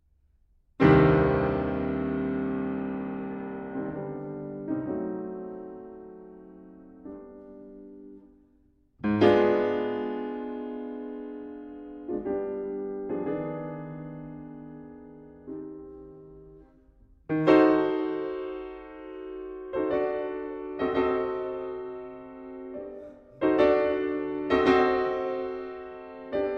Piano Sonata No. 8 in C Minor, Grave - Allegro di Molto e Con Brio